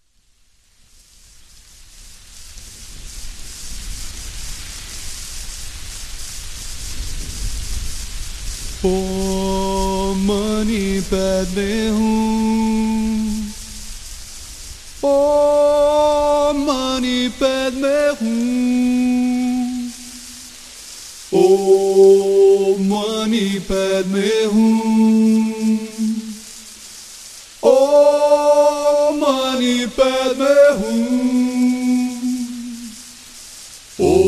om mani padme hum mantra tibetano

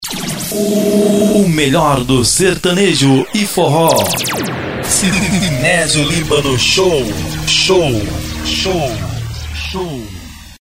vinheta

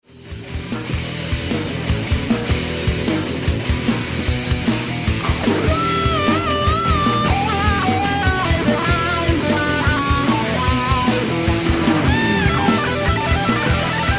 solo guitar

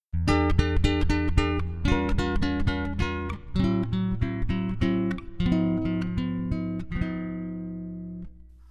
hino gloria jesus aleluia sax violao

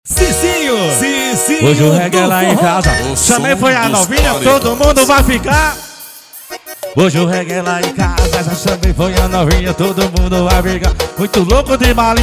bailinha forró

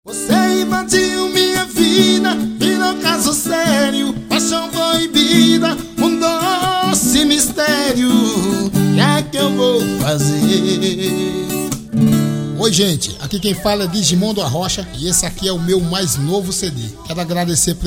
arrocha abertura

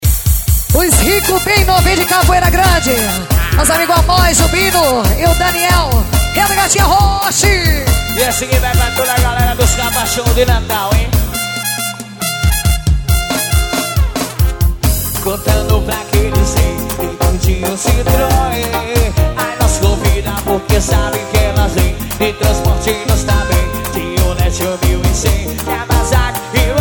ao vivo ensaiando

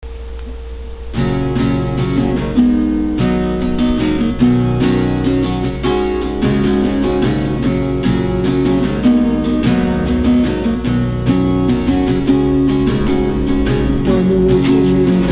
acustico

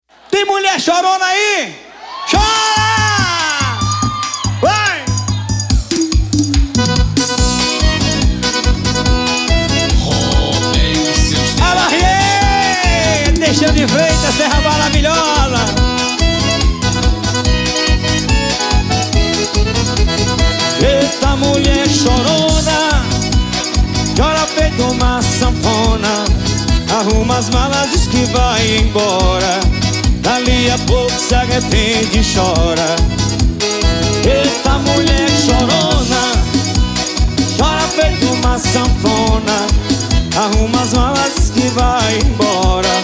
teclados

